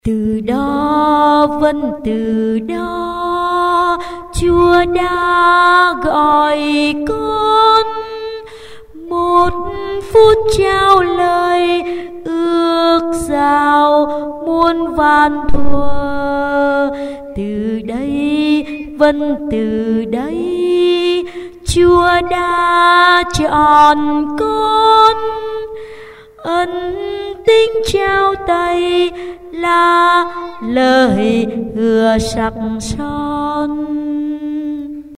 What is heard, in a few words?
alto